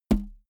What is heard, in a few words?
balloon hit flick